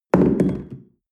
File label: espresso upset tablecloth hard short, restaurant cafe; sport-freizeit